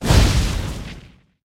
wind, battle

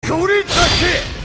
wind; battle

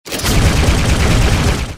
skill rake

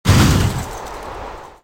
boom, battle

